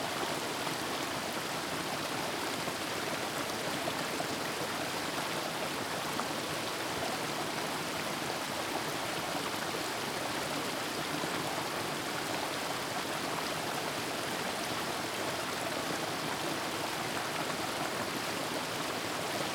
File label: Water Creek Stream